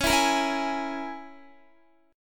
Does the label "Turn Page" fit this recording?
No